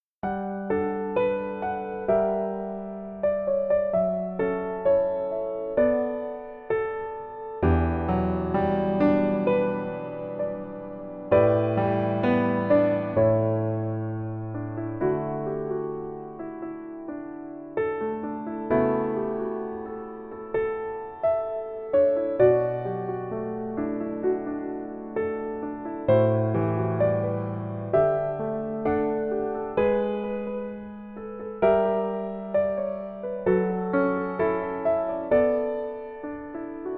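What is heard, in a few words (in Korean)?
Piano version 멜로디 MR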